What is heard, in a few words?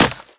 wood bullet hit